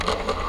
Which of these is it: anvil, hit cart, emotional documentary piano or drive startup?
drive startup